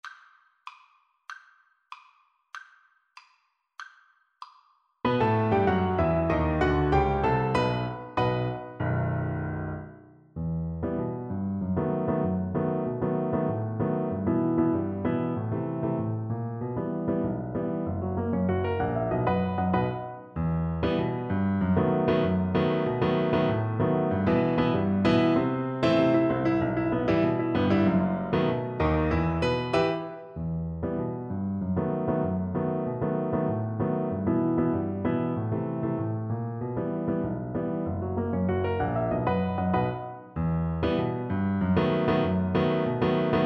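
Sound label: viola karaoke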